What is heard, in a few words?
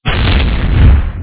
Explosion